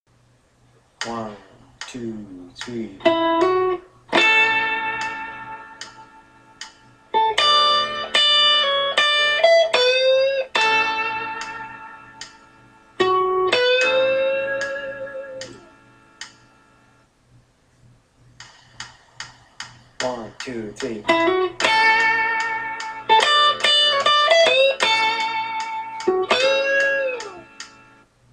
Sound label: style lick